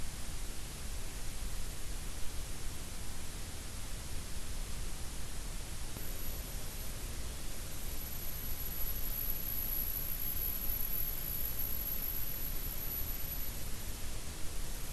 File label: WFR audio emfisis